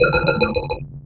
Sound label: BLEEP, Gadgets; XCOM